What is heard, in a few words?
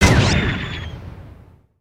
Spider Boss Fire Rain Shot; creatures mechanical